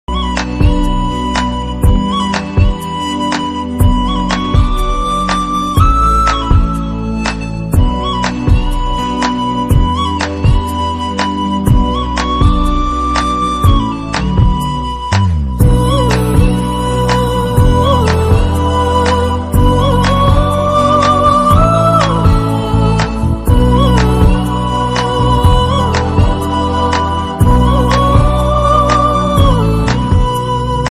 love bgm ringtone south bgm ringtone tamil ringtone